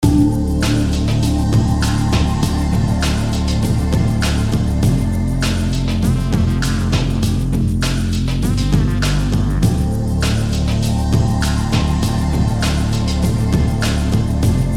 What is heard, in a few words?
loop